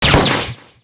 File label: STAFF FINAL SPEAR; skill; FlyForInsanity